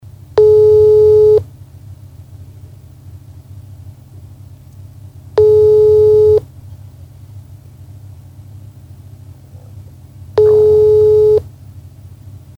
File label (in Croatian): telefon